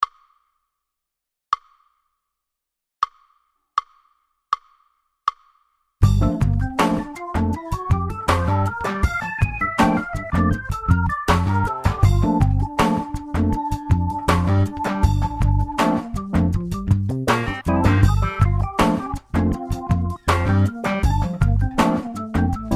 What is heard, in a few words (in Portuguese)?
Exemplo Lick Gm Smooth Jazz